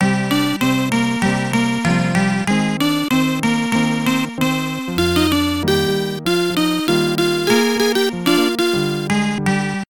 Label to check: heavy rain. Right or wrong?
Wrong